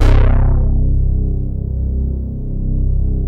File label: POLY DECAY; Masterbits; Vintage Voltage